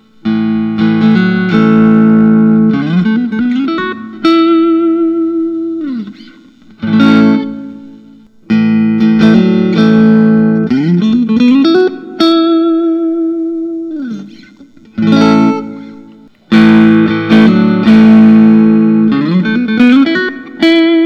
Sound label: whitfill s style fiesta red